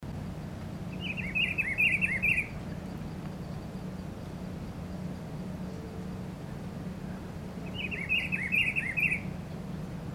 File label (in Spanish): juan chiviro voz